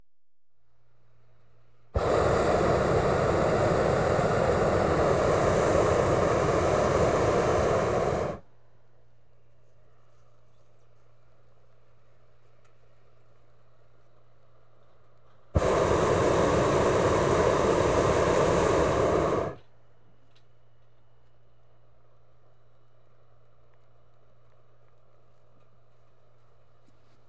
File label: Just Burners And Pilot Light; BBC Sound Effects Library; Adventure Sports